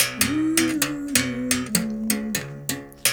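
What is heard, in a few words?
79 bass berimbau phrase; Roland LCDP11 Africa VOL-1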